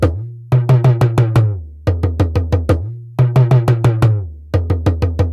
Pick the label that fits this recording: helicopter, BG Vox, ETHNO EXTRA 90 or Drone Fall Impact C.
ETHNO EXTRA 90